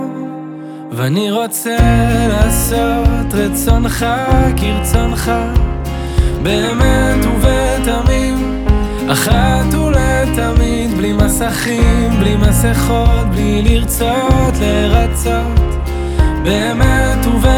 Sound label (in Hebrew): רינגטון